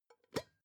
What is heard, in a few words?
pot lid open plop soft; objekte; topf